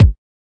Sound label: kick